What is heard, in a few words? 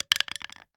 Plastic Roll; Crashes; 1001 Sound Effects